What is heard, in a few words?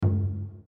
incorrect